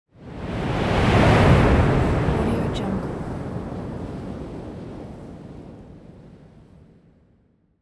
heavy wind gust

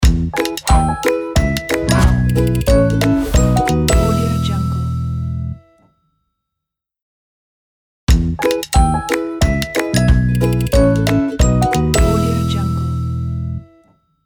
happy logo

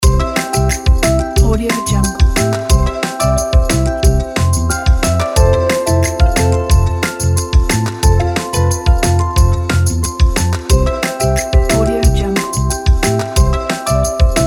fun game

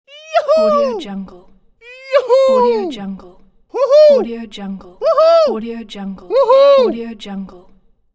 yahoo

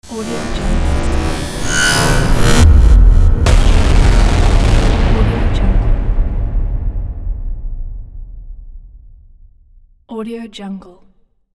cinematic glitch transition hit; greenwoodsound

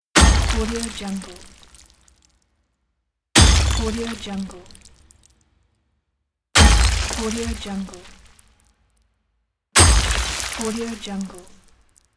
rock metal and debris impacts

